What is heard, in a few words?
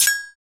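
PER PROCU, Procussion, percussion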